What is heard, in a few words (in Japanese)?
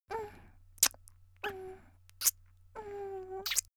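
少女「キス」3回声付き, 元気な少女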